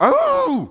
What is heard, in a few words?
Aaa Hurt; Elevation